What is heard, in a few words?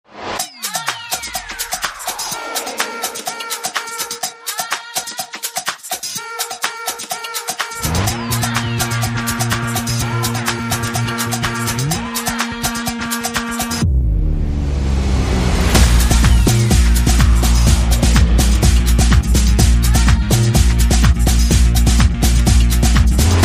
no horns